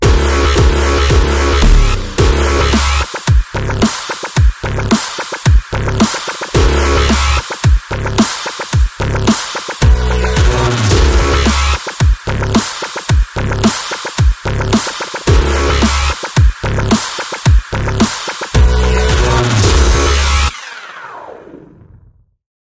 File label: DubStep Logo